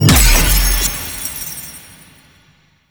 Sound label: Platform Break; Warframe; Cephalon Weave